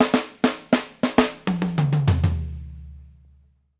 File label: FURIOUS, KD FILLS 84; Sampleheads - New York City Drumworks VOL-1